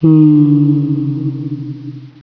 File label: echo, cave